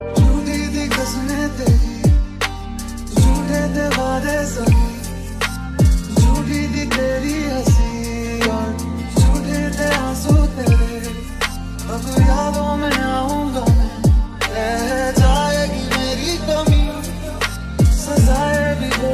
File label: Ringtone